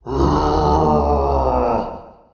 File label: ogre angry, utterance